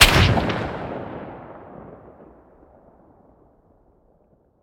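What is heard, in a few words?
weapon sniper sup fire player atmo ext; gmod; viper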